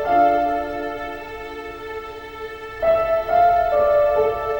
piano, sad

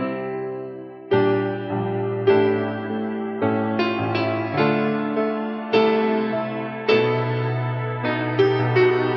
piano, sad loop

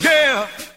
"yeah" sung

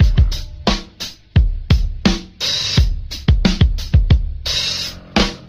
hidden bass notes, funky drum loops

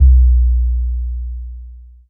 pulse, Heartbeats; Kicks

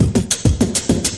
cool rock, Beatbox; Drum loops

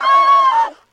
"ah oh"; Voice; ooh ahh

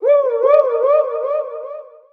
woo; Voice; ooh ahh

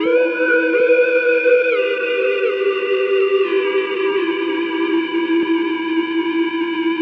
guitar ghost string

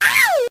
ow, Voice ooh ahh ow ouch

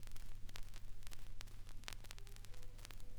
crackles, Vinyl

